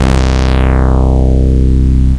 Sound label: Ultimate Bass Root C; Vengeance Samples